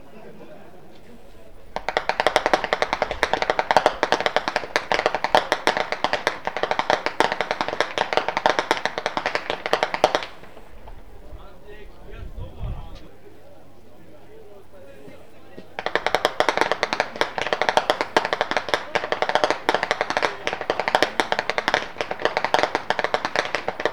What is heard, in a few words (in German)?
schnalzen, flachgau; anthering